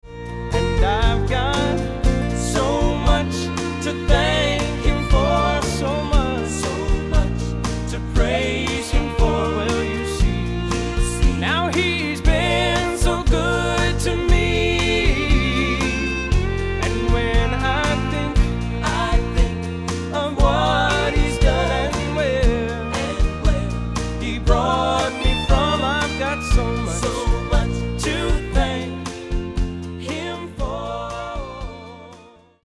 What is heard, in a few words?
Melody Trio